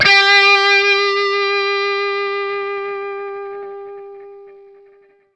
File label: SINGLE G, Vintage Blues Guitar, ELEC.GTR; USB Soundscan vol.22